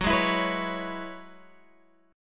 Gb 7th Suspended 2nd Suspended 4th, Guitar, Open F; Gb7sus2sus4 strummed